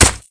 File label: sword hit; matmos interactions